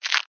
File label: Step Sand